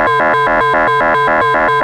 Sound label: Moog D#3, Synthesizer; Hollywood Edge; Giorgio Moroder Rare Synthesizer Collection; MLTI.MOOG